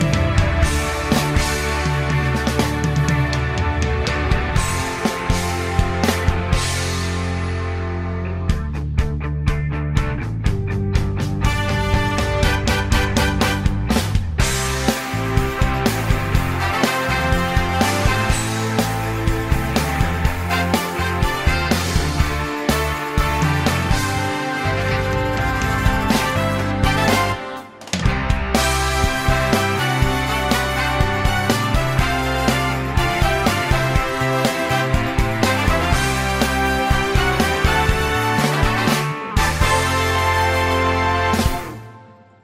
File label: Instrumental; Shrek; academy players audition materials